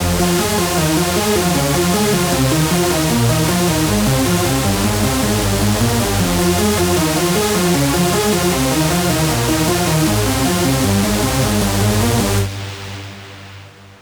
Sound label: lead loop Fmin 155; Music Loops; GhostHack